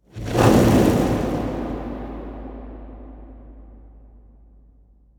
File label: ambient fireball flyby; Portal 2